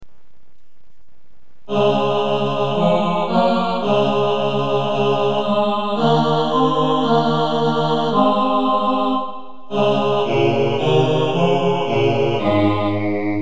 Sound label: tenor bass, choir